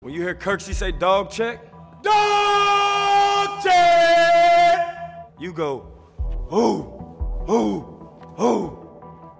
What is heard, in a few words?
Dawg chant